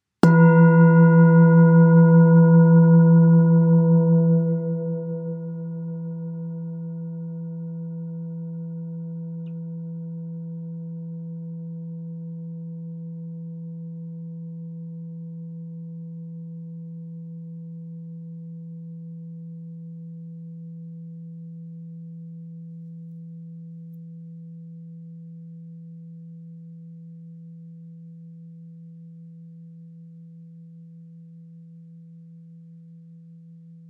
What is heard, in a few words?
Singing Bowl